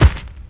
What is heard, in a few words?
enter